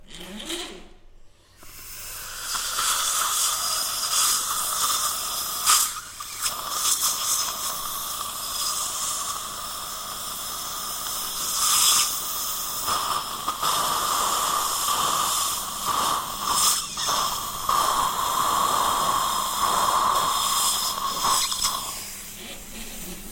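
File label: saliva ejector sounds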